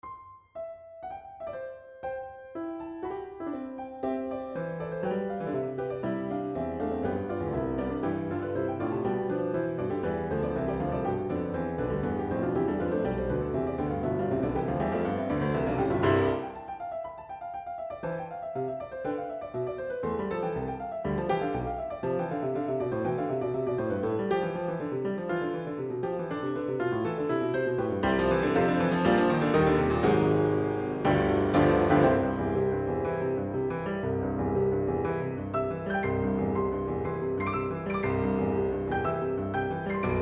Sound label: 4 Hand Piano